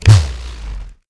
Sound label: mech disk; lego ninjago rise of the snakes